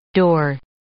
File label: door